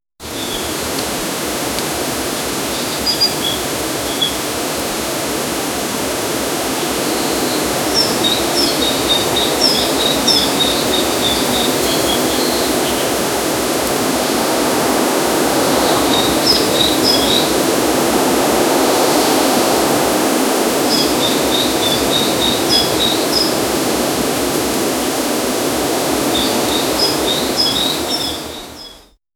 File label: wind through bamboo birds, japanese